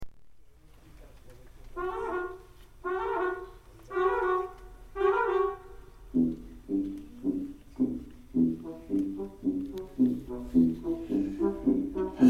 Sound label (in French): les pompiers